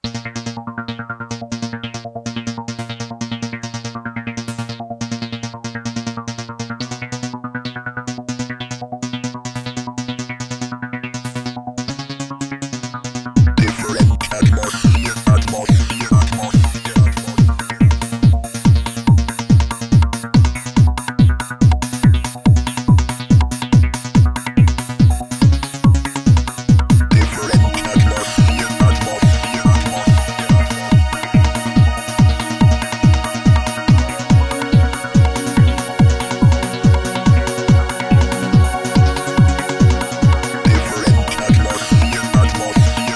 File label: Different Atmosphere